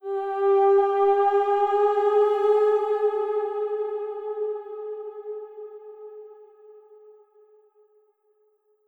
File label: Loop End